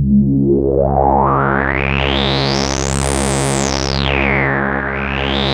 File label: ARP 2600 C#2, synthesizer